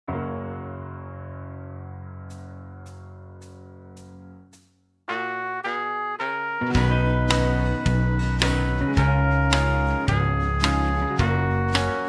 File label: Medley 1 Tone Below No Backing Vocals, backing tracks